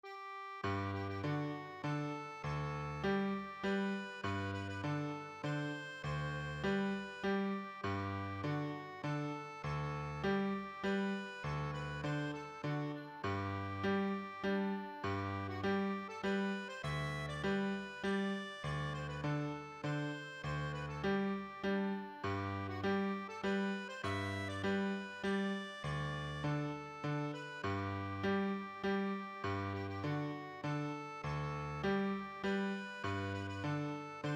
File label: Piano